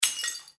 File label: glass breaking